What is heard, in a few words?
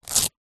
velcro shoes childs pull; foley